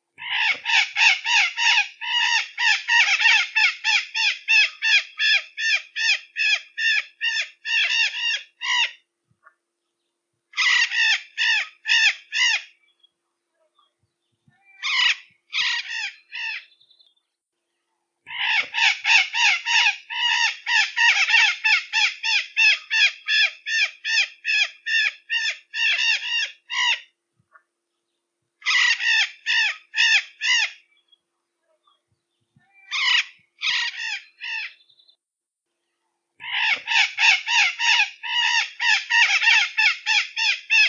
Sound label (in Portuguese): Canto da maracana verdadeira